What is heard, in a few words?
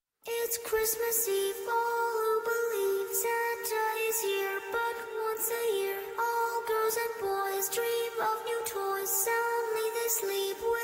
scary christmas ringtones; iphone